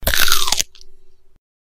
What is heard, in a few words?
crunch sound effect